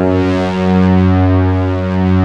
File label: BIG MOOG; East West - Global Sounds